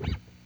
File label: JAZ NOISE, guitar; Quantum Leap - Guitar & Bass